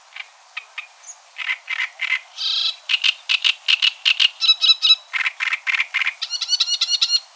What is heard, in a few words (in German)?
drossel